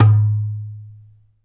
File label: DJMB, African Perc; Spectrasonics; Supreme Beats; African Contemporary; SB Afro DRMs